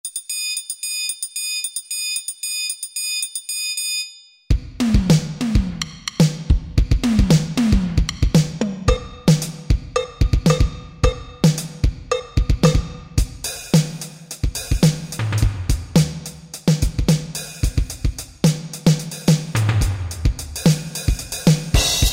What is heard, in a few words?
DR Kit, Yamaha TG33